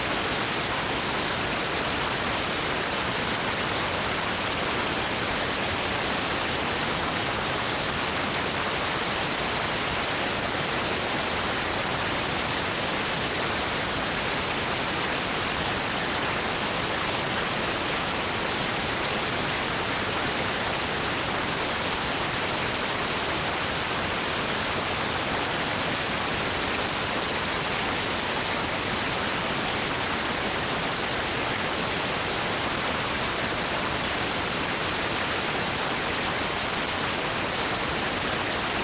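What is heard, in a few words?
radioteletype receive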